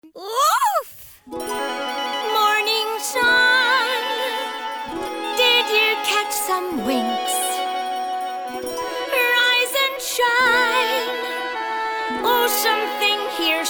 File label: Cello Lesson